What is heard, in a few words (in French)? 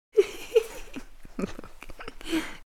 rire femme